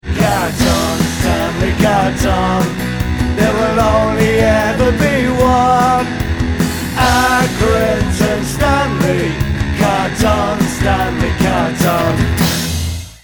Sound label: Chorus